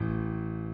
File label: key